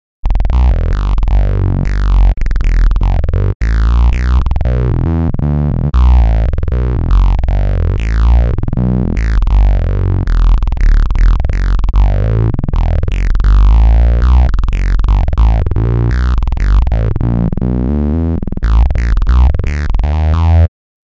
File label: bass; propellerhead; reason4